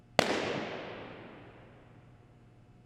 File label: IR Balloon Pop, Hinkle Fieldhouse; Room Survey